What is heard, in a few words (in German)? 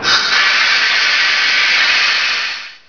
dampf; hurrican